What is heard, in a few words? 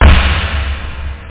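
AKAI SNARE; Groovy Bytes: Behind the Moon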